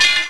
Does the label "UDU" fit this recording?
No